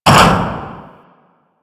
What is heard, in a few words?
Pillar Rise Lock; Warframe; Clan Dojo; Obstacle Course